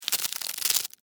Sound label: Insect Attack, Animal, Insect, Attack, Fight, Battle, Movement, Wings, Shake, Bug